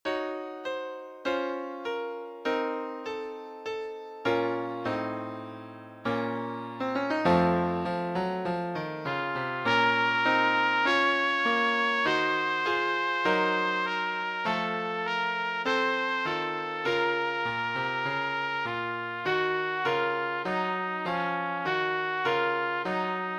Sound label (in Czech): trubka; Přednesová skladba pro trubku; Rigaudon - trubka; l k daken